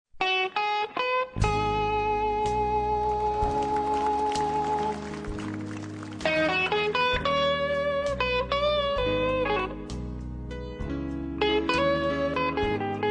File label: blues; Lo schema del blues è di 12 battute, articolate in tre frasi di 4 battute ciascuna.